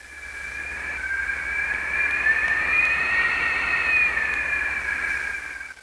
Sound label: fly wind